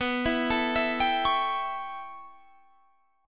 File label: A 7th B, chord; A7/B; arpeggiated